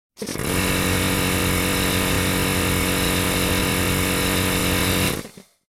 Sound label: Compressor; 100 sound effects